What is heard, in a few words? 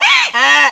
donkey hee haw